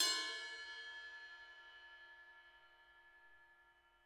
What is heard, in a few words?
RIDE, RIDE CYMBALS; Spectrasonics - Backbeat